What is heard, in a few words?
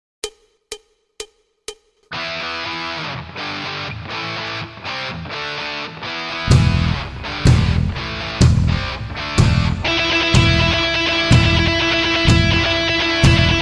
hard rock